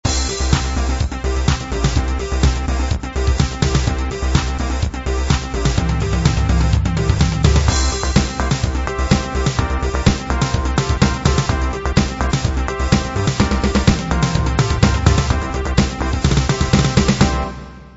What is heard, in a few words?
rave